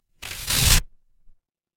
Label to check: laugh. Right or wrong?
Wrong